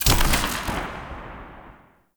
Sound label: Cannon Tesla Equip Charge; Weapons; Player; Ode Cannon